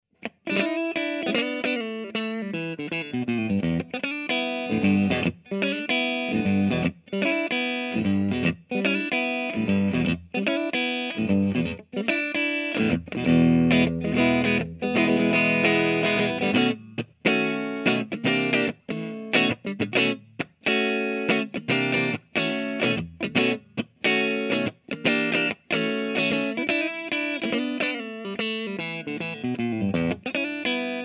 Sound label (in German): E Gitarre Clean UAD Apollo Twin